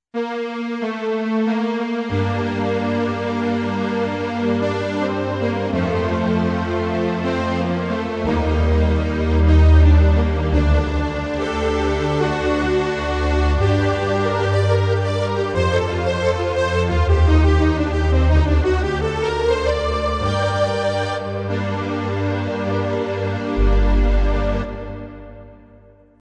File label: low string